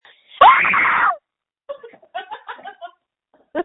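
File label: screams that feature laughter; screaming into a phone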